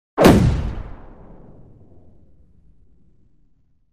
Gun Shot Lrg Hvy Sho, shots

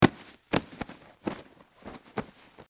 Grabs Cloth Movement

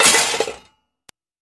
Junk Thrown Box, junk crashes; Junk Thrown Into Box

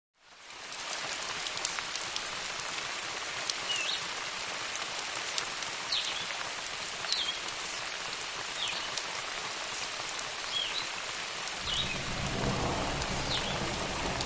Rainforest Ambience

